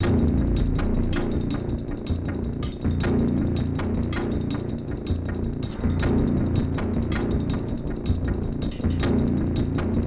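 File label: music, percussion bass loop; transformers war for cybertron gamerip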